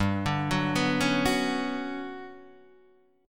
G Major Sharp 11th G, Guitar Standard; 3,5,4,4,2,2; GM#11 arpeggiated; capo=2

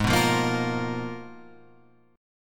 Ab Minor 6th Add 9th, Ab, Guitar, Open F; strummed; JGuitar

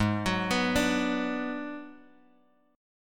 Ab Minor 7th Flat 5th Ab, down, Guitar, DADGAD

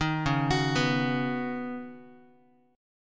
Db 7th Suspended 2nd Suspended 4th, Guitar; JGuitar

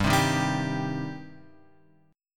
G Suspended 4th Sharp 5th G, Guitar; Gsus4#5 Chord {3 3 1 0 X 3} strummed

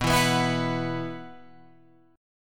B Suspended 4th, Guitar, Open F; strummed; JGuitar

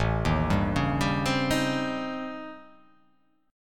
A 7th Sharp 9th Flat 5th

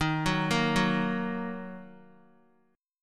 D sharp Minor, Guitar; arpeggiated; JGuitar

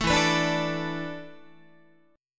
E 7th Suspended 4th, Guitar; E7sus4; strummed

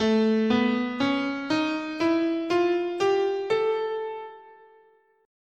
A Lydian Minor

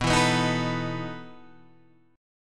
E Major 7th Suspended 4th B

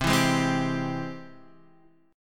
G sharp Augmented Major 7th C

